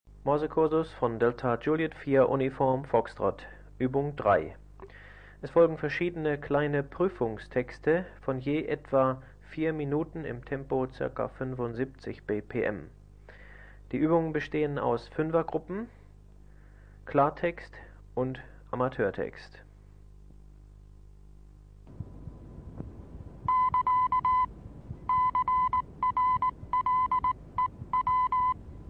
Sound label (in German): Morsen, Morsekurs